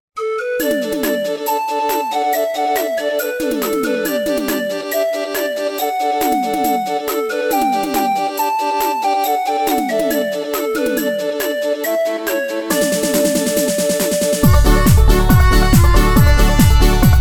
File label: Korg PA800